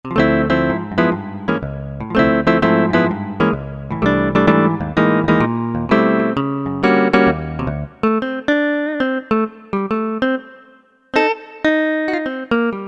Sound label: Jazz guitar, Korg MSC-6S Fretted Insts; Fretted Insts 1